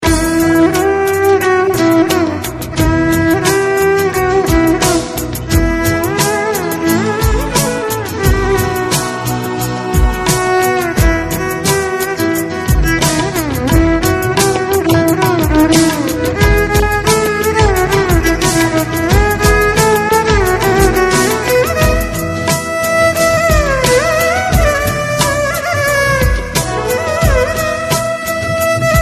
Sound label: Violin Fusion